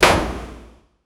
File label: a chill sound for my, hammer